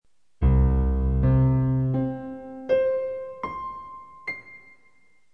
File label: octaves